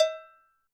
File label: bell, Zoom MRT-3B